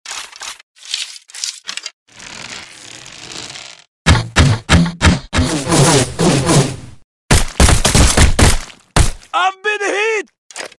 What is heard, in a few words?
soundpack bows